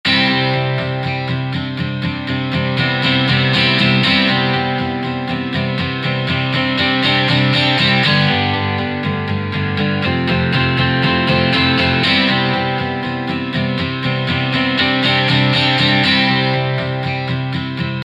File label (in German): Strummed mit GR